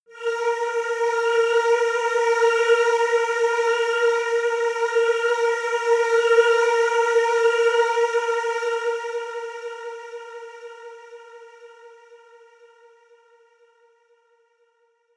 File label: airy choir; toene